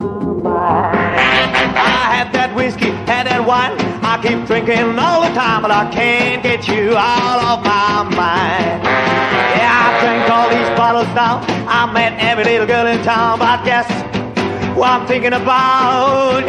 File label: Electro swing